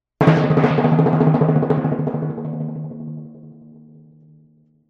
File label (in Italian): PC Decrescendo